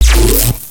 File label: laser impact